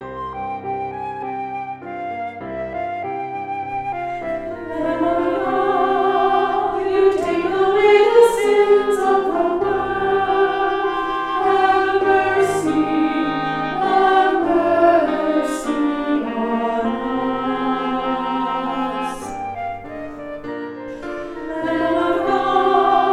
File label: Lamb of God melody; music ministry